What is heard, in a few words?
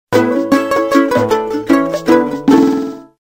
power off latin